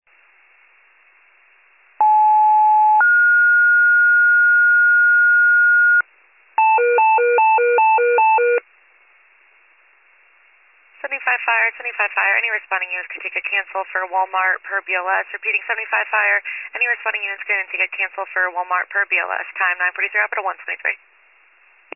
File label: fire, pager alert